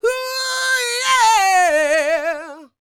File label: D YEAH, Vocal R&B Dance; DB DANCE